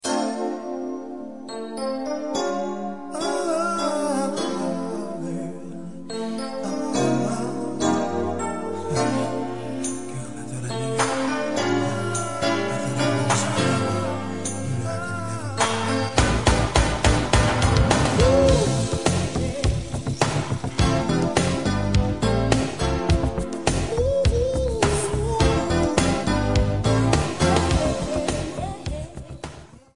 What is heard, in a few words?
yamaha dx7